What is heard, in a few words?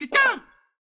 Hit Vo; Dragon Lady; Portal Quest